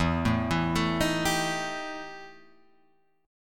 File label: E Minor Major 7th Double Flat 5th E Guitar Open G